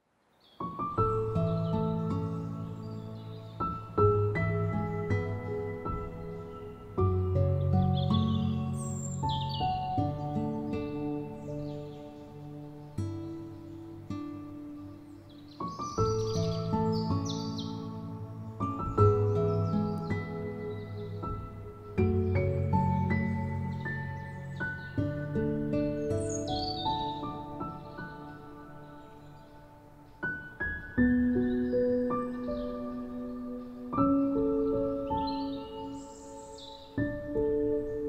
Beautiful Relaxing Music Light Piano Guitar Flute Music with Birds Singing